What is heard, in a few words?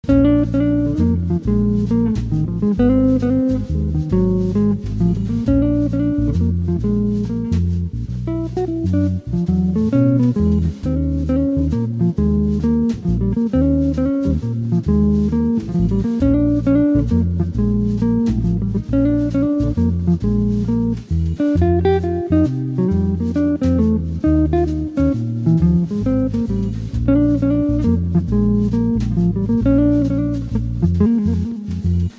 jazz pedalboard